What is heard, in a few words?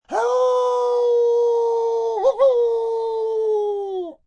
Wolf Raspy Howl, Wolf howling, raspy, Animal, (human voice); Wolves; Animals; VoiceBox